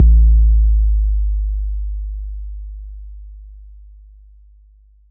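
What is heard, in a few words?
bass drum 808 Tube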